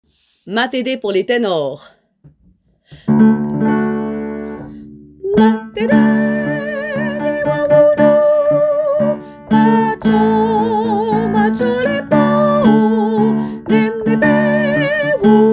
Matede Tenors

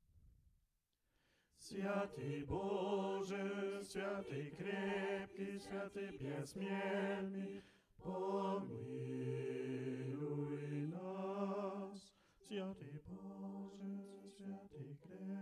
Svyiatyi Boje Basses

